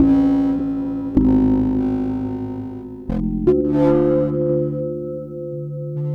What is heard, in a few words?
Dark Bass, Drum N Bass; Sonic Foundry (Sony Creative Software); Knutrix Drum & Bass